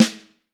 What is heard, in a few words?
Vintage Snare Drum; 5X14; Q Up Arts; Johnny.C's Vintage Snare Drum